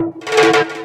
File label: Loops Fills; Vengeance Samples